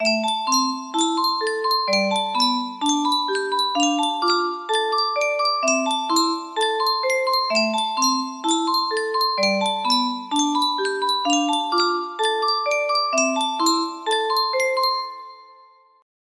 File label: sankyo tune music box, music box melody